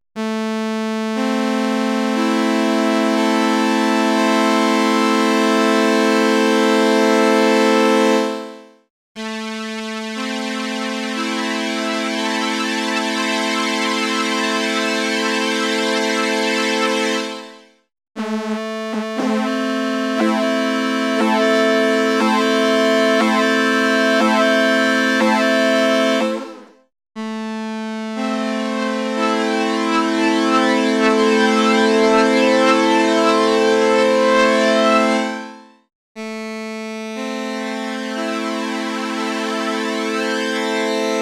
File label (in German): Klangbeispiel FLANGER BL-20; ARTURIA